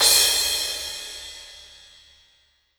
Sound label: soft hit finish; osu!